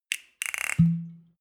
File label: bottle whisky cork pull out creak; gastronomie; berufe